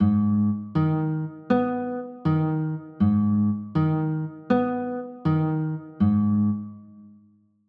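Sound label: G sharp m6 chord, guitar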